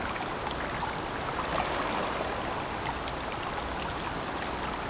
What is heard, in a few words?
waterfall, bloom minigame